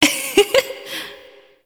Laugh; Emotes; Nineteen Ninety Nine

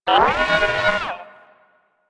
Leech Attack Spotted, Dialog; Leech Osprey

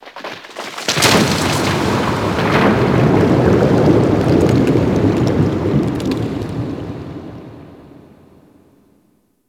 Ocean Cave Crack Large, Ambience; Warframe; Grineer Ocean

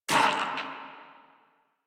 Pain Crewmen; Warframe; Corpus Crewmen; Crewman D

